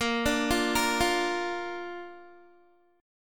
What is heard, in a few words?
Bb Major, Guitar; arpeggiated; 18,17,15,15,17,x